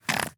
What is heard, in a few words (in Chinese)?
狐狸被激怒声